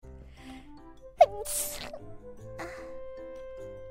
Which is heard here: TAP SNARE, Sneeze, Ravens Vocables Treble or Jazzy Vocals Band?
Sneeze